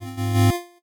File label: 8bit se